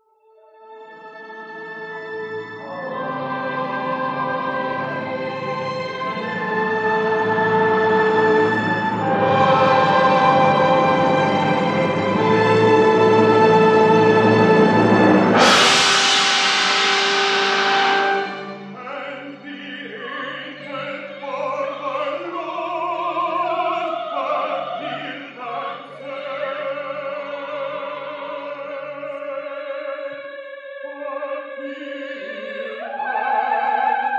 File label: meditation